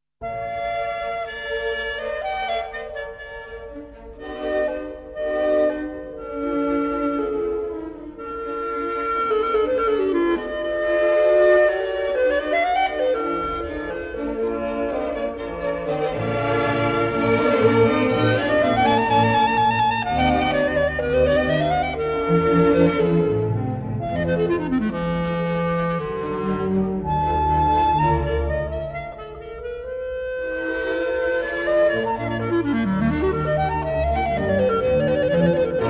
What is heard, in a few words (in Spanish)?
clarinete; mozart